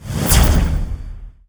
alien death explosion; hero; Kingdom Rush Frontiers

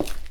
footstep; Hunters and Props

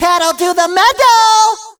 Pedal

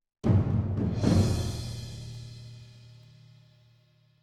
Trans Soft; World of Castles

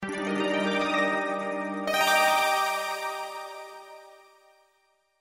Logo (Arcade Version); Capcom

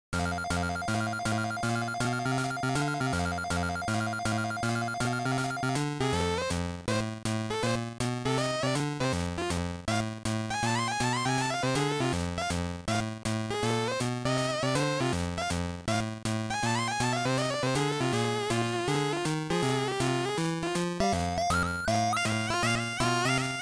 Pause Menu; the cowabunga collection

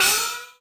China Cymbal; puchicon; dsi ds

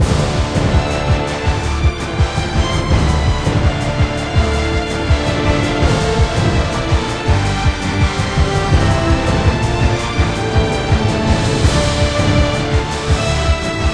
Battle Loop

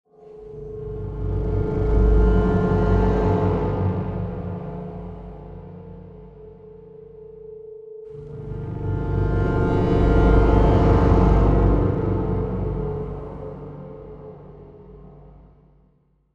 Tension Mood; The Last of Us

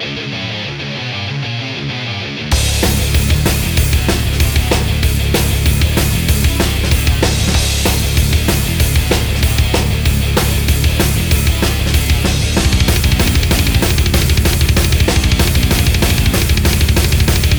desperate situation loop; otome the exorcist

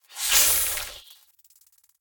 Flying Insects, alerted

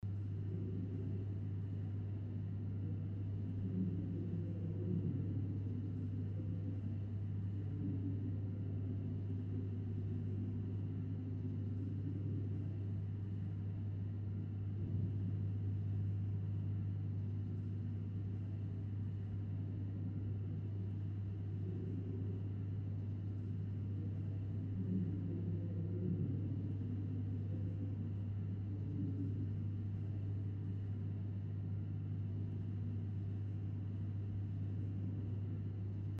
Bathroom Lockerroom Ambience

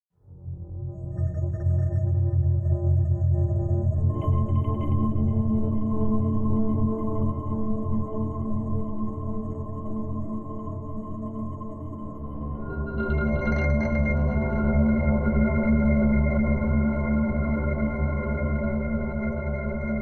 Drone; Drone Four

